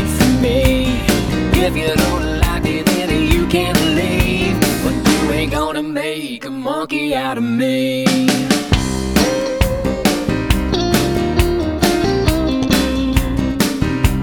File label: Monkey Song